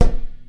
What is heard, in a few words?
Physics Rubber Tire Impact Soft; Counter-Strike: Global Offensive